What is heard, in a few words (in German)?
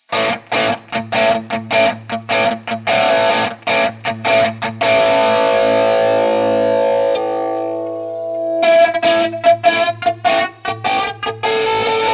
Steg; kong camo-flange